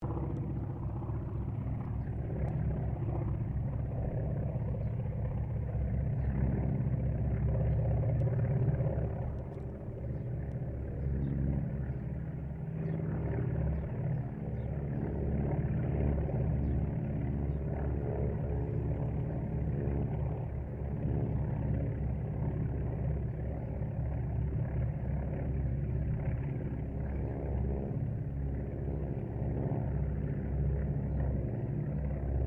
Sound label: AIRCRAFT CERES WINJEEL WIRRAWAY PASS DISTANT; Mixed aircraft squadrons; Airplanes